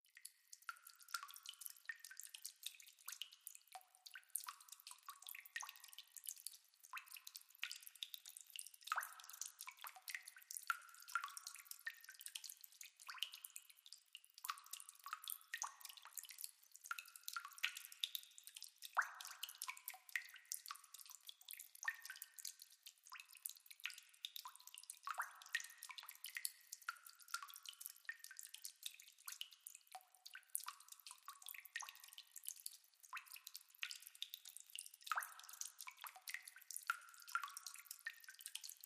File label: Cave Ambiance, drips